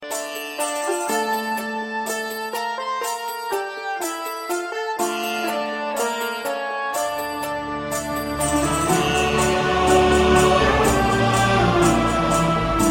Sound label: Natrang Instrument